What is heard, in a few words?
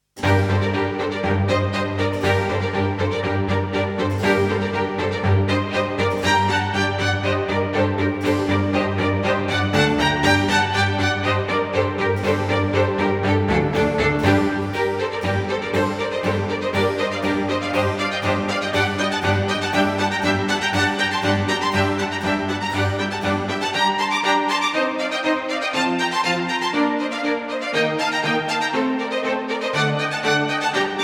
Virtuoso Baroque Violin Concerto, NO CHAMBER STRINGS; No. 1, Four Seasons